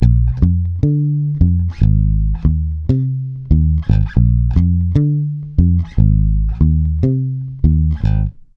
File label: salsa, bass; Sampleheads; Ultra Freakin' Bass; 116 BPM